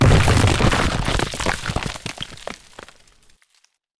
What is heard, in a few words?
rock break